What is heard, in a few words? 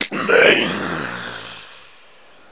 pain; healer